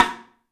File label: MARFSN, 3D Drums, X STICK; 3D Sound